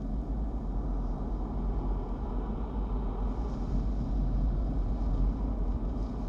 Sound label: Ambience Loop, outdoor